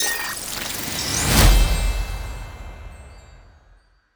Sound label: Reward